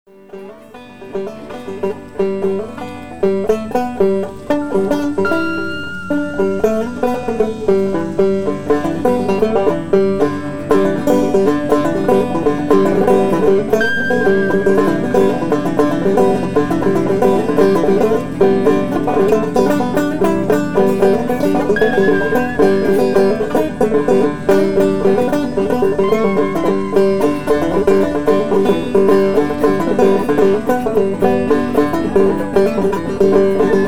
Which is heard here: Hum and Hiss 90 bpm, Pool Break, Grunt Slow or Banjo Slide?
Banjo Slide